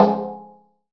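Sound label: snare drum; Zero-G; Chemical Beats; SNARE ZOO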